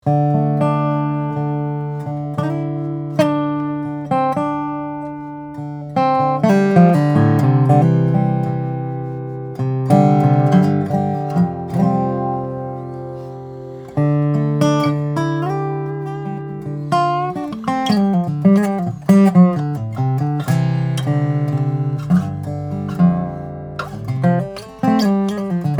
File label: acoustic; C F Holcomb Kodiak; resonator; cocobolo; spruce; hollow body